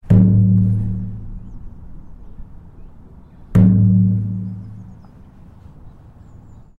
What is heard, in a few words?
sculpture bonging draped seated woman bronze; henry moore; yorkshire sculpture park